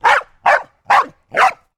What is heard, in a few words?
dog barking; Animals